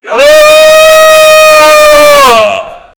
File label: vocal